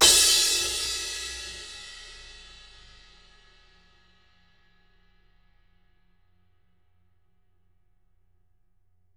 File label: crash